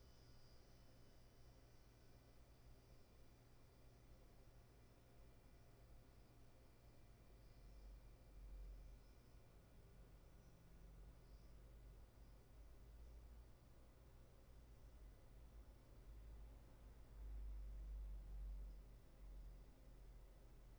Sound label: room tone